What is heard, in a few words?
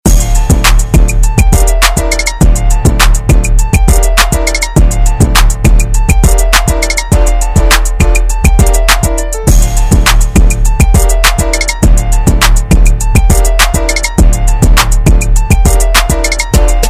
Hiphop